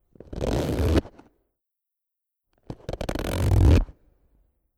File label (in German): Reissverschluss auf und zuziehen, Suessigkeitenverpackung; Fiorucci